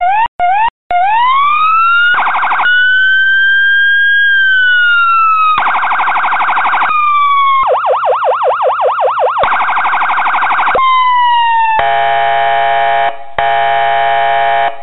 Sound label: Siren Chase